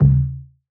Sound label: Vintage Percussion, Sub Tom; Cymatics; Mystery Pack Vol 4